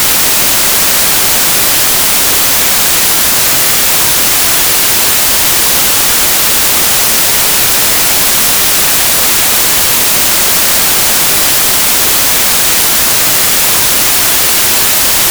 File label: Whitenoise; steven colour